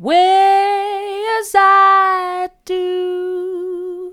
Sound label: mid, vocal